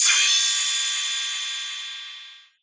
CYM, Drum & Bass, 160 BPM; Ueberschall; Drum & Bass Flash Trackz

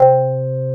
Korg CX3, C 1

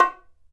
Bongo, EMU PX-7 drum machine

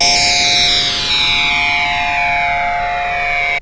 FX; AMG Megabass REMIX! VOL-2

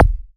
KCK, Yamaha TX81Z synth drums

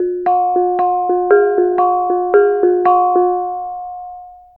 BONANG; Spectrasonics; Heart of Asia; BONANG PH

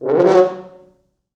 4 FrHorns FXMOD, F#2; East West; Quantum Leap Horns Sax

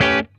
CHOP, Funk Guitar; Zero G - Funk Guitar

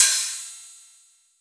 TR-909 Ride; Roland BK-5

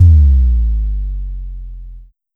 Drum X Sub, Pearl Drum-X

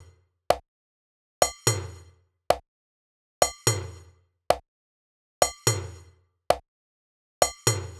Loop 120, Perc Loops

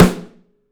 Drums, STEEL; 3D Drums

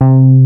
BASS C3, Hip Hop; E-MU Formula 4000 Series Vol. 1; Hip Hop Nation

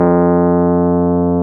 PNO, Electrics, Rhodes; Club-50 - Foundations Roland

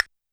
Castanet; YAMAHA RM1X

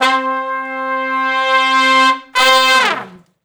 Long Note Swell Falloff (C) unison, Horncraft; Sonic Foundry; Horncraft for R&B; Sections

